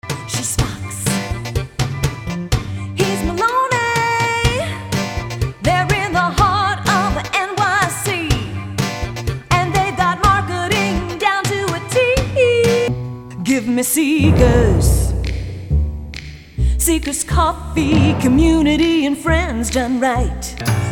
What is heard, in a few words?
Jingles